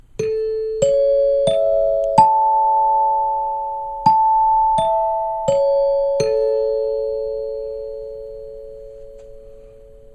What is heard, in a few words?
Dinner Chimes